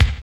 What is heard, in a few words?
KICK DULL, Ambient Kick; Roland L-CD701; Ambient K1